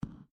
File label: slime footstep; Adventure Time - Elemental; ThrillGames